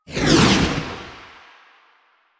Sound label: Motorcycle Passby Whoosh Medium; Motorcycle Ambience; Vehicles